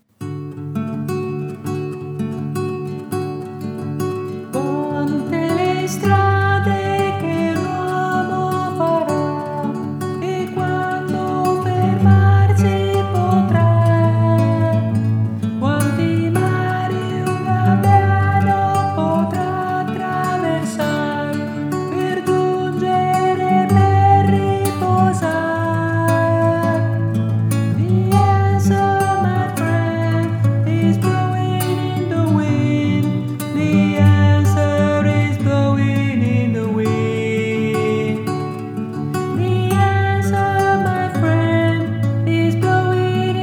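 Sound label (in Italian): contralto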